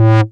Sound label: Bass Multi Rev A; Vengeance Samples